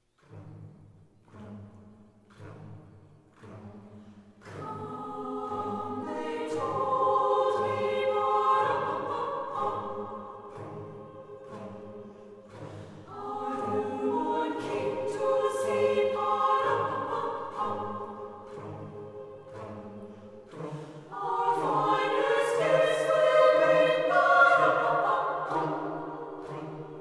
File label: Carol Of The Drum; schola